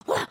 headbang; emotes

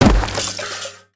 instrument smash; destructibles

